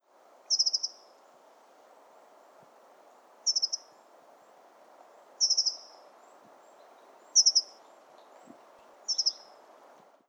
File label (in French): accenteur mouchet